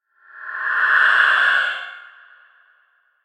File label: horror sound effect paranormal